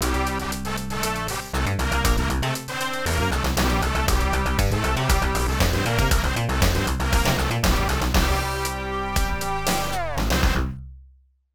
Tournament Victory; Grand Striker; Human Cup

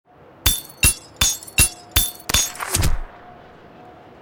Hammer

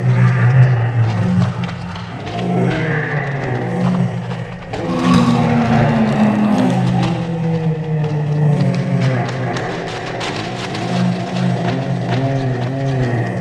Meat Hole Ambient; Golden Light

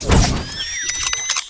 Drone; rabbids multiverse; iOS, macOS, Mobile

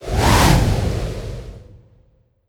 Wind; Legend of Keepers (gamerip) (2019); Imja Wind

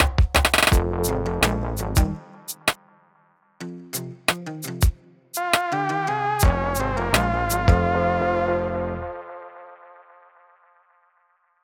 Swamp Battle End

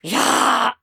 Mantis Lord Death

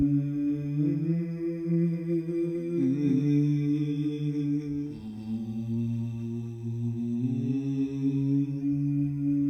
Engine Hum Loop; Gs Engine Hum Loop

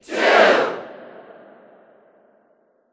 Tennis Crowd

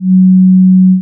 Haptic Camera Print

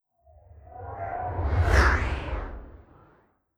Gob Tamb Spell

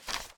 Footstep Paper-Sheets

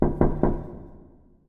Knock On Door; battle suit aces; Yan

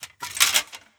Armour

